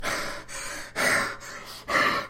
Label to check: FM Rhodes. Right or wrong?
Wrong